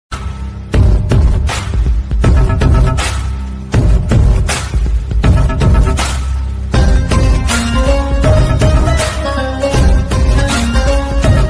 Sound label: Music Ringtone